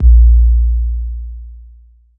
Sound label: tr 808 kick a sharp key; kick drum samples